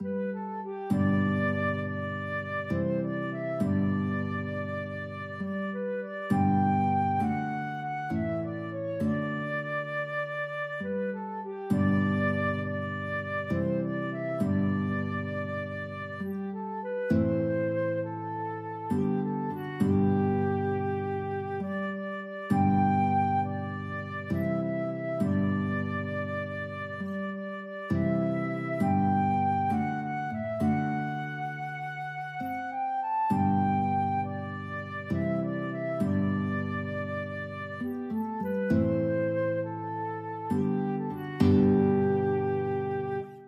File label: blessed assurance, flute guitar duet